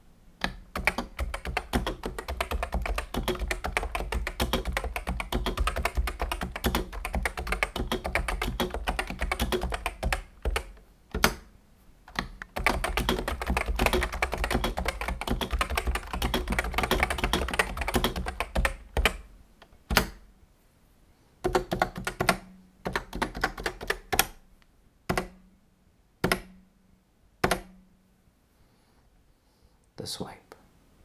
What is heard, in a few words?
Realforce Stock Keycaps OEM